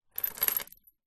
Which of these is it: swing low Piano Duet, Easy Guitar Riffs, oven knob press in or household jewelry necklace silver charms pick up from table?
household jewelry necklace silver charms pick up from table